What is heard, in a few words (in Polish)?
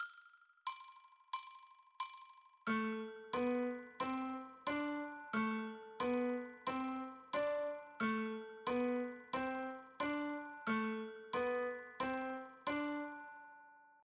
Gramy tylko na pełne wartości (1, 2, 3, 4), skali A-moll; IMPROWIZACJA; RYTMICZNE TENSION